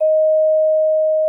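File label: vibraphone D#4; Xsample VOL-4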